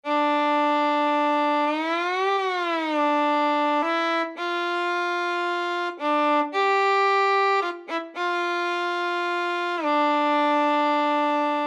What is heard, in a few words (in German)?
solo violine; swan